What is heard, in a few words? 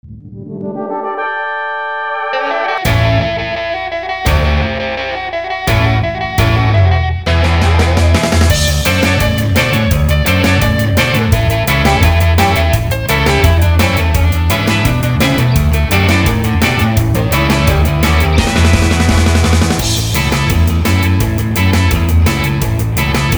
Retro Hippie Bus Battle